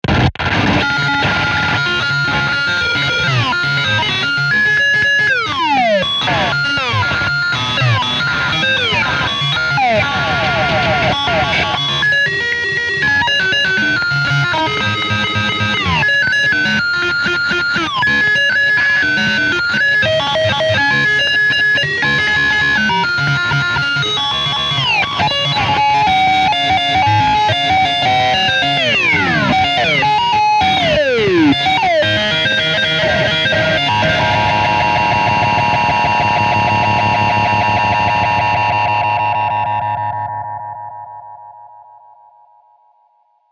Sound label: circuit sludge bent circuit noise